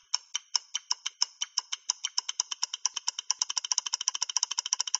timer 5 sec

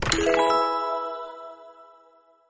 mystery cube open pos

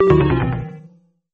mystery cube open neg

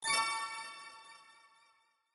leaderboard up

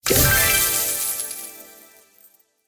avatar daily rewards

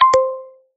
end Recording

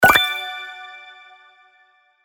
coming out

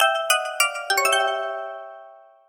wrong answer

